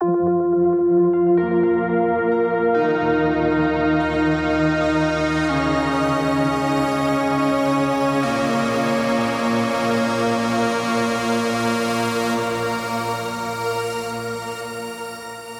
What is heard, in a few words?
Lead; neuro; InsideInfo